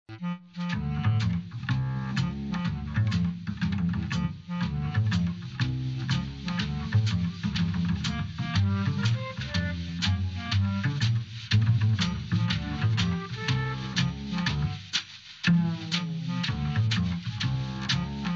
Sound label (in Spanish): MUSICA BLACKJACK